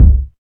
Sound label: short kick single hit c sharp key; kick drum samples